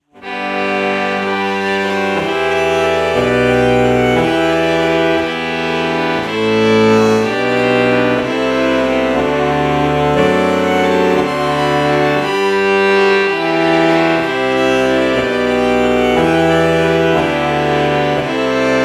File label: alto saxophone violin morph